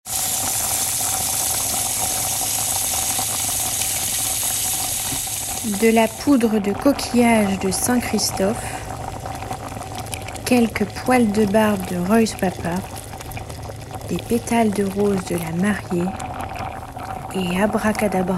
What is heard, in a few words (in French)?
Montage sonore sorcière